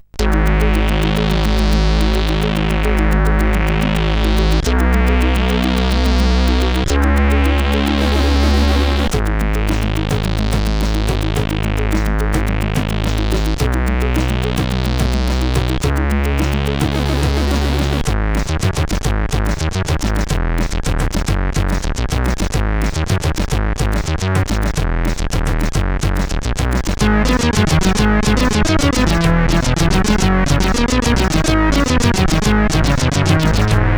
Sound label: Hell Machine, CSG8580R5